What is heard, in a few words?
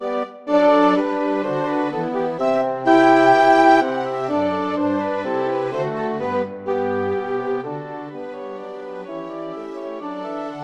bourree, orchestra